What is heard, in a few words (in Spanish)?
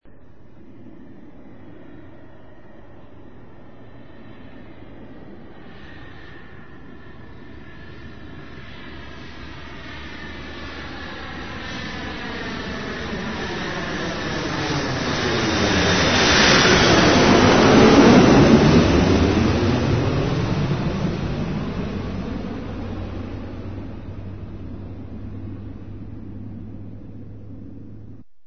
avion; Sonidos/transporte; Avión 1